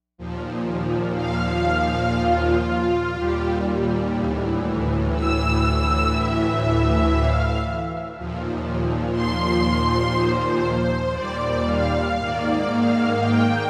JP-8 strings; Jupiter-80

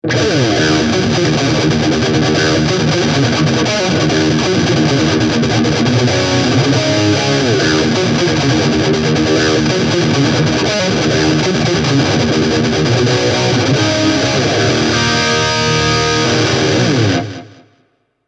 metal stack; cube-20gx

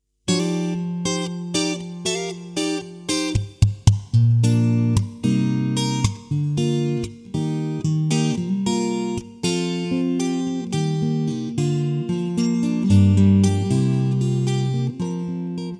ac sim straight

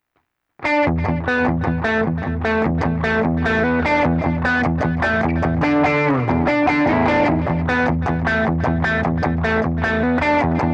tweed delay; cube 60

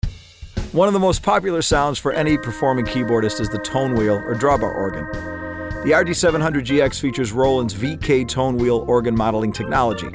tone wheel organ; RD-700GX

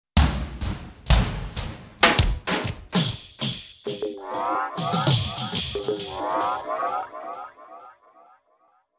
delay effect; SPD 20

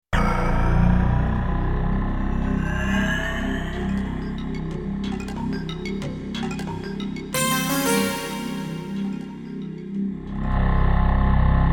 vc 1 dig native dance

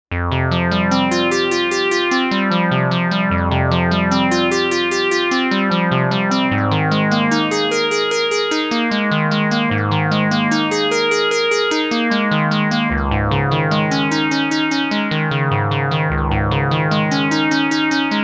PWM Solo

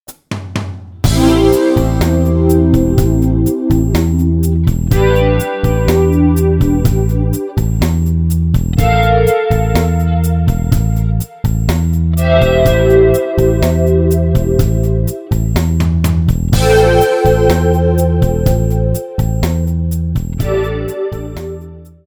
patch demo

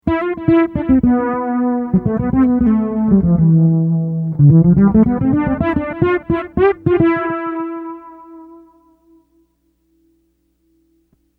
delay chorus

